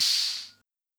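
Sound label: Hi-Hat Open, Luxor Passet; Gulbransen; Drums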